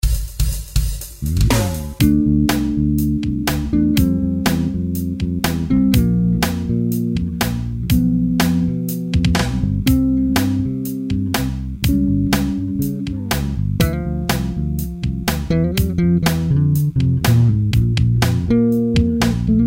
bass

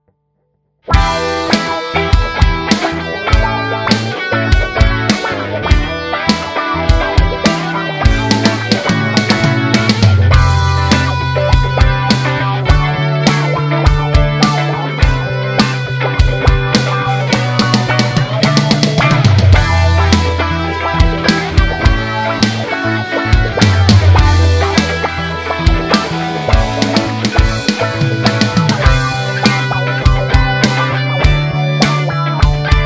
a song from the drum

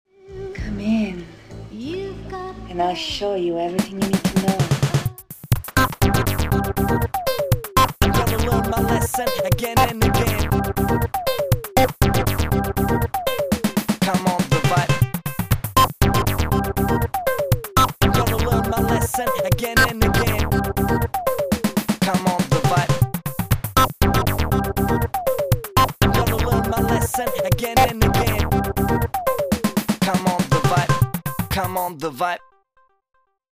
tekno

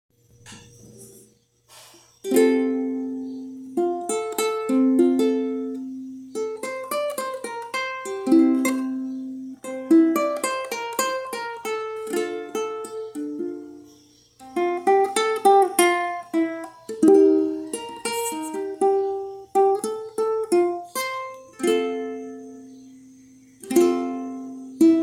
gtr

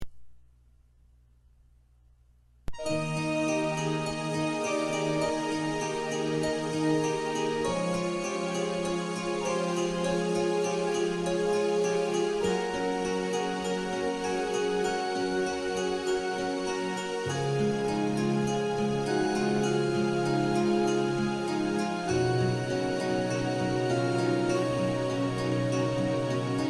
instrumental

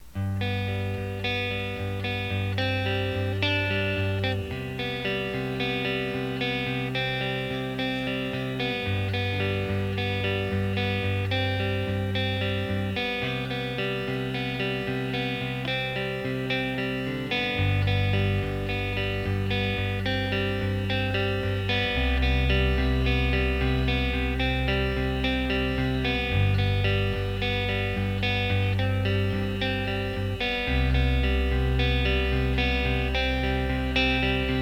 acoustic guitar backing track in g major e minor

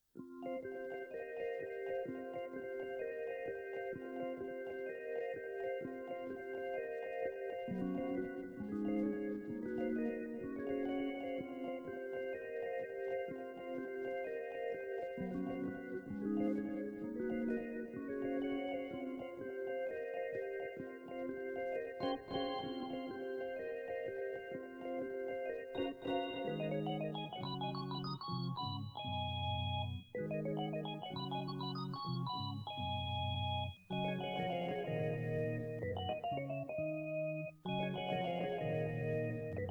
instrumental

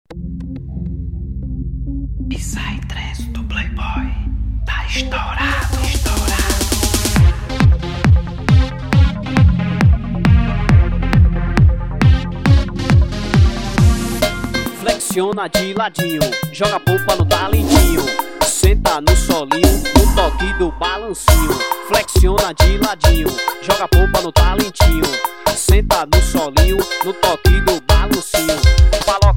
psy trance

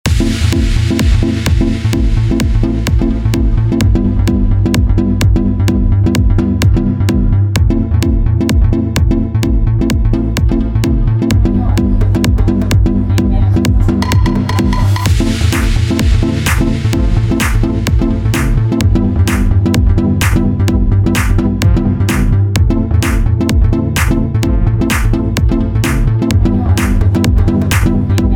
dance

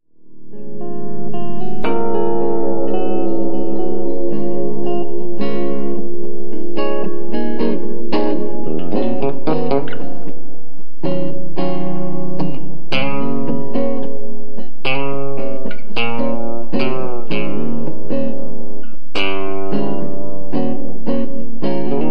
bass solo

